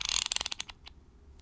Ratchet Muted; Smol Mechanisms

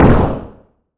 explosion echo

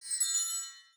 chimes, magic

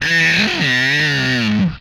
death, vocal; MMRetroArcadeSoundsPack

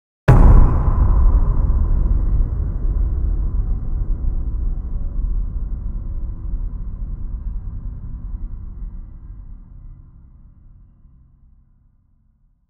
Jumpscare, Horror; Horror Sound Pack

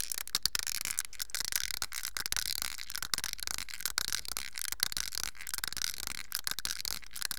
Plastic Dice Toy Rubbing

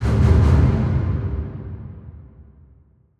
Stabs Strings Low, Horror Strings

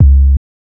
Bounce Up; FREE SFX PACK VOL 3

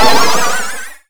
magic

